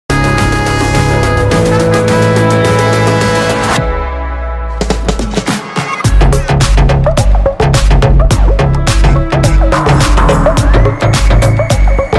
Slow motion ktm rc 200, music bgm; kgf; slowed

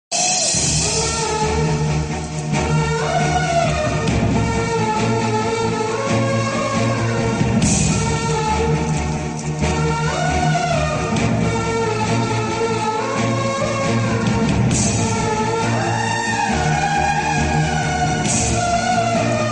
bgm ringtone music; varsham movie

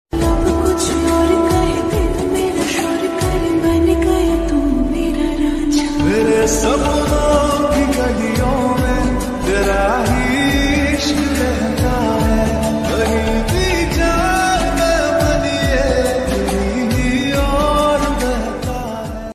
hindi gana ringtone

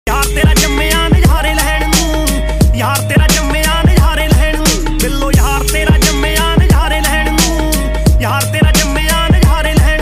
punjabi song

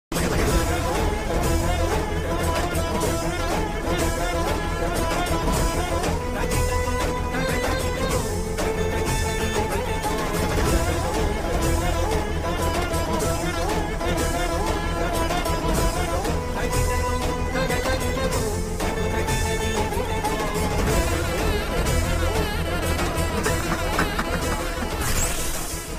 bgm ringtone theme music; mookuthi amman

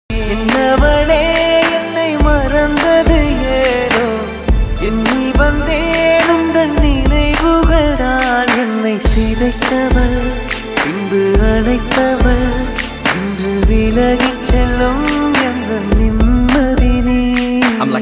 love failure song

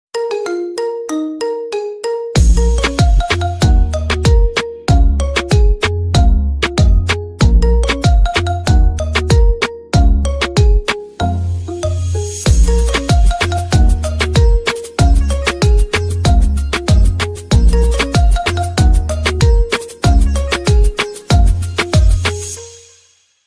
marimba remix ringtone

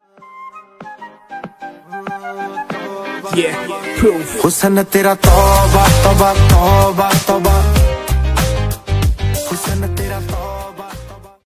ringtone songs; newz

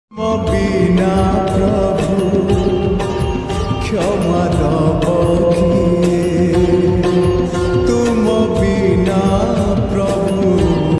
odia bhajan ringtone song

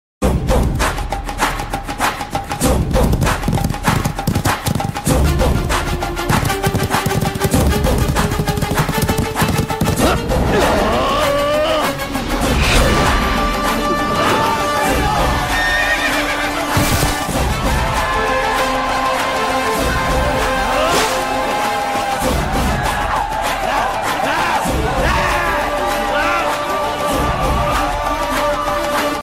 powerful BGM; magadheera; ramcharan; rajamouli

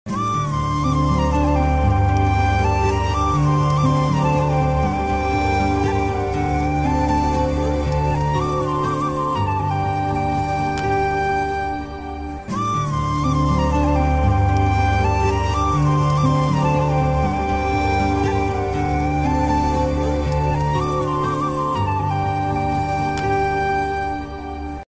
sad bgm ringtone; raayan

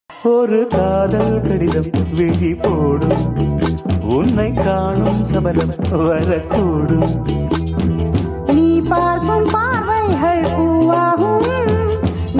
song tamil love

